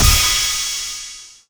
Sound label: Kick Combo Burned